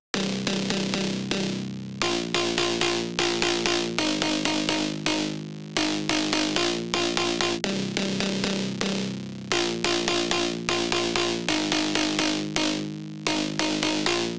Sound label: 128 BPM C Min Bass; House; Drop Loops; Cymatics; Golden Hour